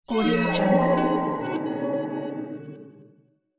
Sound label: dark crystal drown spell; game sounds; باطل شدن طلسم